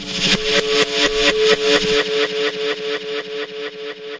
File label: Electro FX; Ueb HE Electro FX